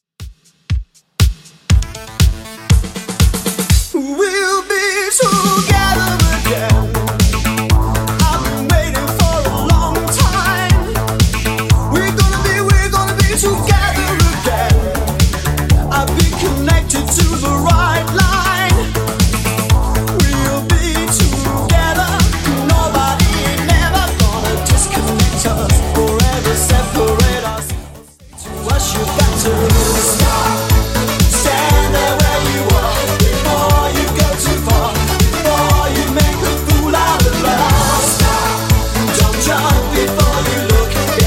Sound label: 80s Redrum 120bpm